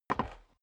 step boots wood creak single stair up; schritte

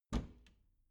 bathroom cabinet doors open soft; objekte; tueren

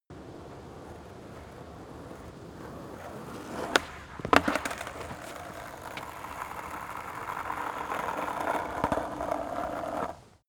skateboard single drive by jump fail; sport freizeit

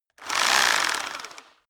rock drill hammer rev medium; bohrer; soundpool

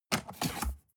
cardboard box open unfold scrape short; objekte

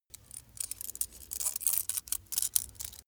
small metal scratch; industrie wirtschaft; metall

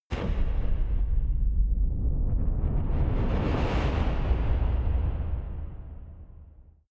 massive metal rumble

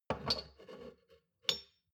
shot glasses stack slide soft; soundpool

